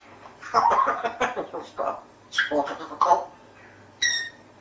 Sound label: wahaha